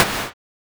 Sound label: ele Snare; Mistergenerator